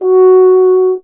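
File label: brass French horn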